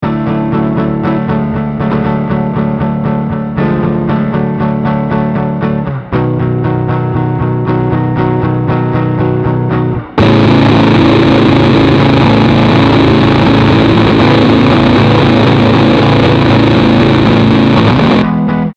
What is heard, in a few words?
creepy; apocalypse